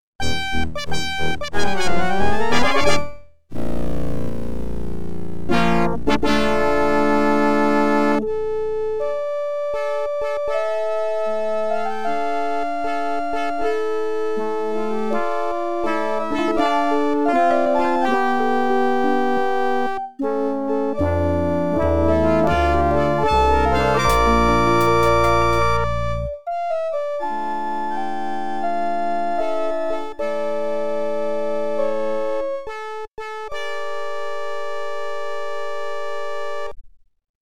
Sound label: OPL2; Death and Funeral; X WING